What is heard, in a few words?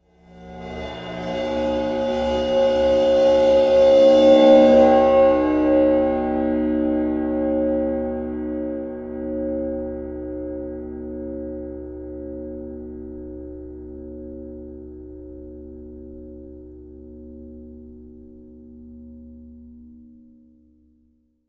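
Ride 22 Cymbol Bow close up 3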